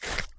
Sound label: attack